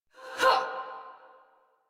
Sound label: Attack Effort; Warframe; Dax; Ranged